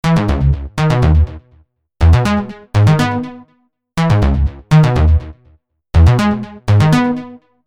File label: Bass Loop 122BPM C#m; Volante; Unison Artist Series; Mac Depulse